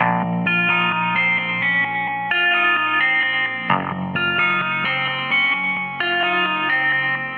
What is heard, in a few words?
keys, jazz loops